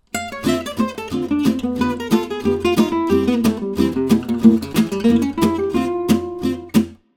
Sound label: lick, guitar